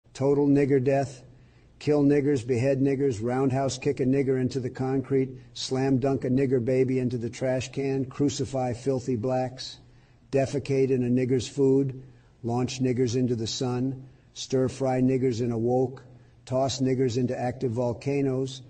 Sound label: chant